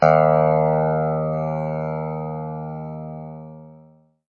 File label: chime